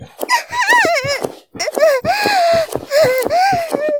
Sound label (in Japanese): 絶頂, ASMR; 騎乗位